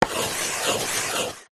chalk stick thick draw circle on small chalk board; office